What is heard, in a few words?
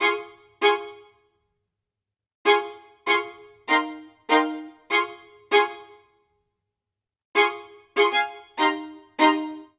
Phrase Birdsong F Minor 98 bpm, String Quartet; MIDI Clips; Ableton; Spitfire Audio